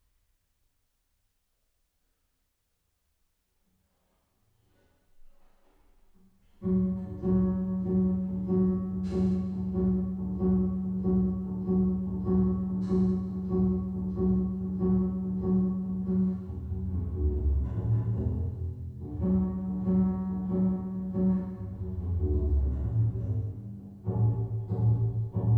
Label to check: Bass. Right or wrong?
Right